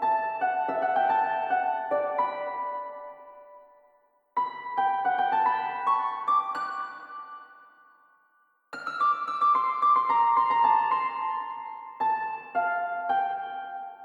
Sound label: Harp; Toki Tori 2